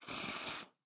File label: Eat Noodle; blue archive